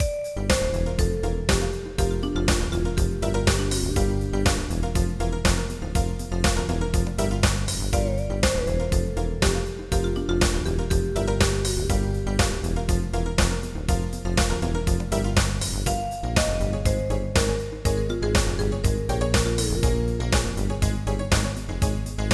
Marimba

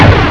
big psionic; Odyssey

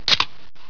can open; 8bit

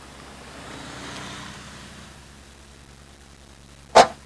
ebrake; transpo

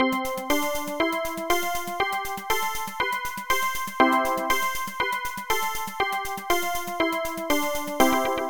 Scale

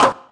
pin shot, tennis; VirtuaTennis

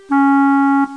WOOD

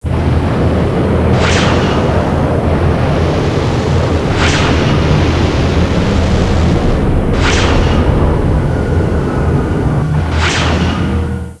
cavern; Babylon 5 - Entertainment Utility

